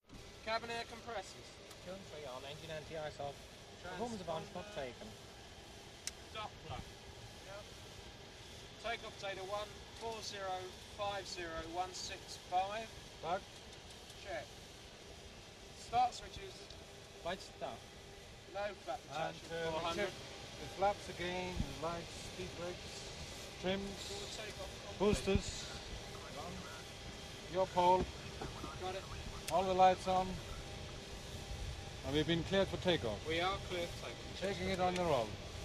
Boeing 707 cockpit, pre takeoff check list and takeoff, radio, post takeoff check list